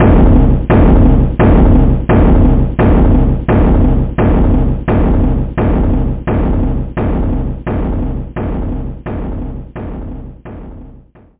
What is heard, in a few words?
big drum